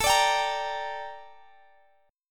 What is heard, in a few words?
G Minor A, chord; Gm/A strummed